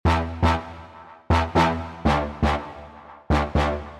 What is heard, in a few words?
serum preset brass modern